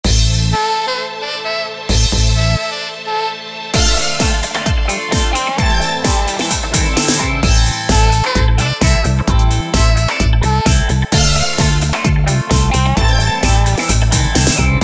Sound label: Discotone